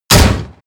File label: shotgun close shot